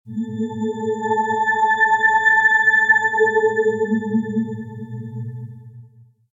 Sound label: harmonic event